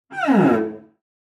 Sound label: Cello Slide Down; SoundsCrate